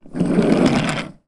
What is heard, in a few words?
toy car plastic roll wood floor; zapsplat